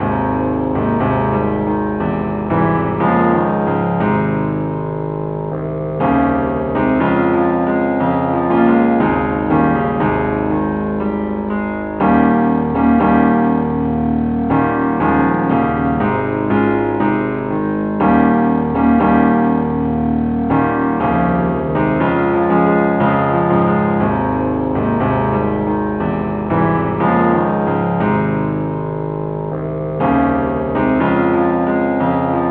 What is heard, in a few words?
keyboard key cis